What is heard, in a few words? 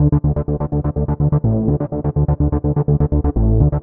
Arp, Synths; Arp Of Sorts; Sonic Foundry (Sony Creative Software); Underground UK House & Electro